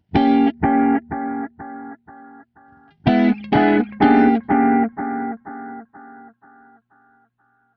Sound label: Bucket Brigade